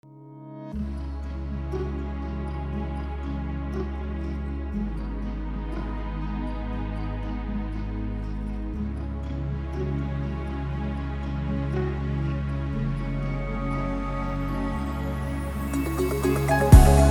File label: instrumental; persian